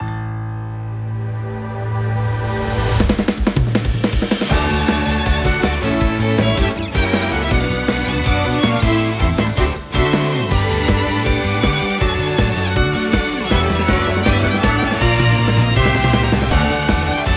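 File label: Song short Instrumental; AI The Somnium Files Nirvana Initiative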